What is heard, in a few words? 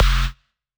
Bass F, Bass Synth